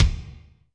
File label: Kik Chunky HL, Drum Machines Alesis D4